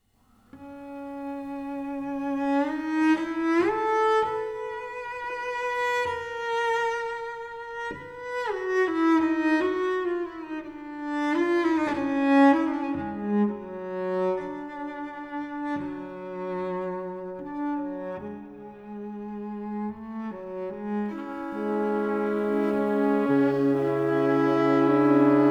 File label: Cello